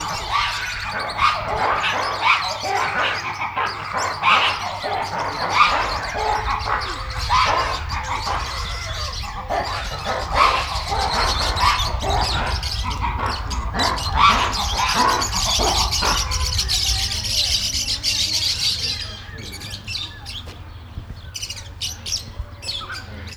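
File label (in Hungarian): veszekednek kisebb széllel, varjak; állathangok; nyíregyházi zoo 2010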